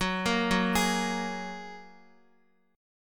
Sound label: F sharp Major Add 9th, Guitar; F#add9 arpeggiated